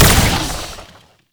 Pistol Projectile Explosion; Weapons; Warframe; Tenno; Temple Signature

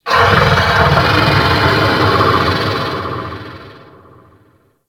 Mountain Beast Call; Warframe; Corpus

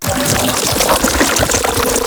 Tentacle Flail Loop; Warframe; Abilities

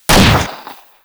Fire Shot 2D, Weapons; Warframe; Bronco

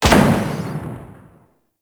UI Menu Select A; Warframe; Caliber Chicks Minigame; Title Menu

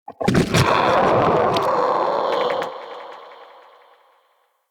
Shrimp Vocal Angry, Creatures; Vocals; Baliq Shrimp Glade

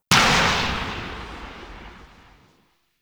Pistol Charged Tail; Operators; Drifter

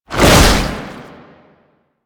Underground Attack Swing; Enemies; Abilities; Warframe; Proto Infested; Babau

